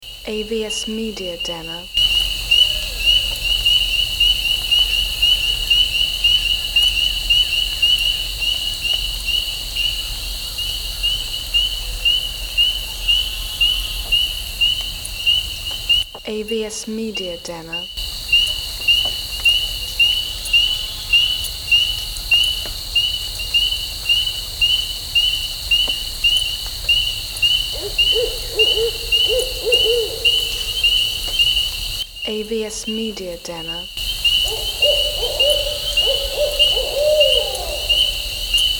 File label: Winter Woods